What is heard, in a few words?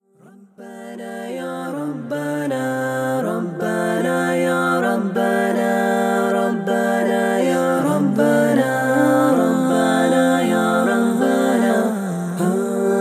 Karaoke Version